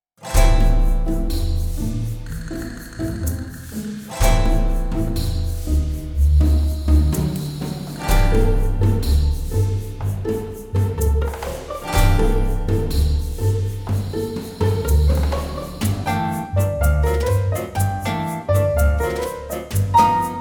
Tango

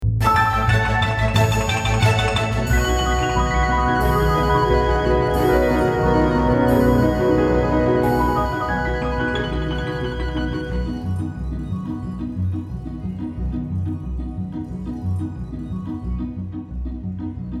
Bird call accomp

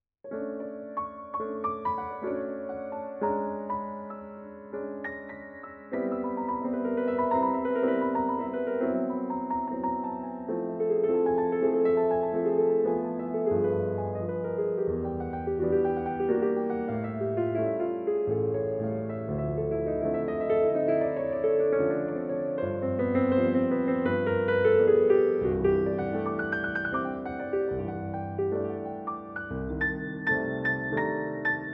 prelude improv

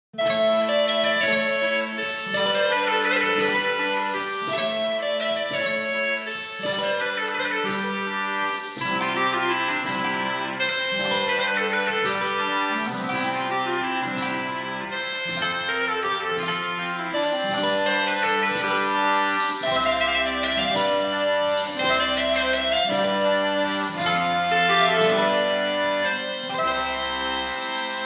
Crumhorn Suite

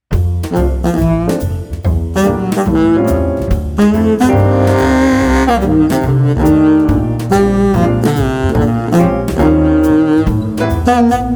Basses; Loaded

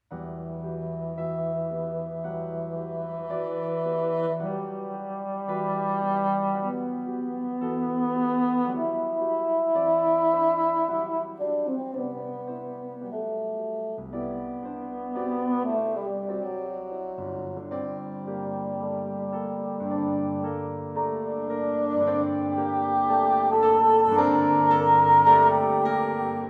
September Melody

